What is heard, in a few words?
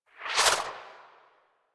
Morph Cast; Warframe; Warframes; Khora